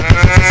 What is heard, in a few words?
sheep machine gun